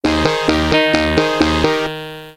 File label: gamble win; Fruit Poker; fruit card poker